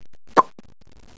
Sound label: CORK PULL; Custom 3D Home